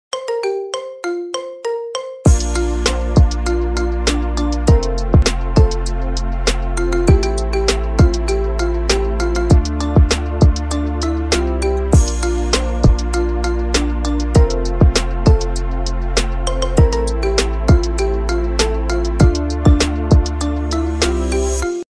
ringtone marimba ringtone

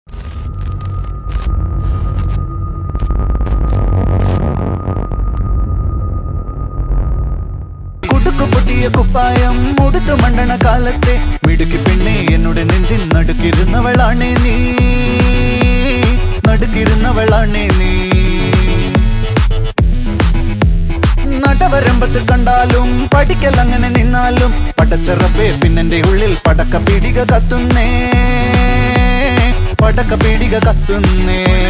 ringtone, beats; love action drama

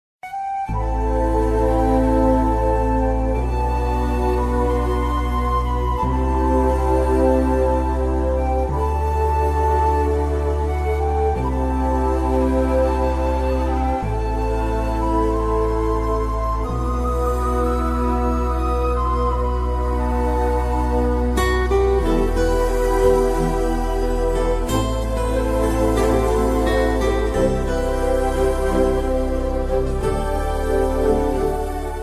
sad bgm; bangalore days

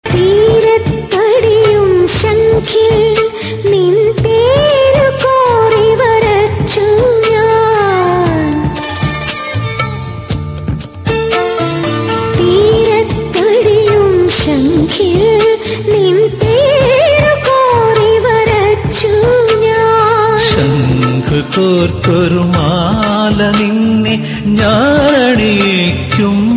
evergreen malayalam song

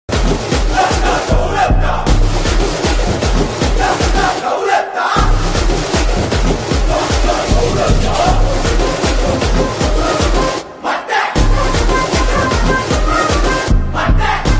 song bgm song ringtone; vijay thalapathy

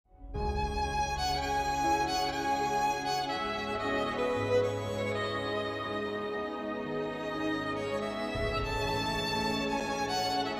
sad instrumental ringtone; kaffara; laiba khan

